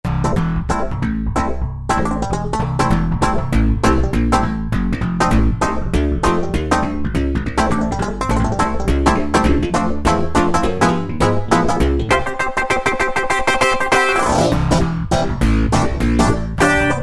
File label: KY Thumper, Yamaha TG77; Factory Presets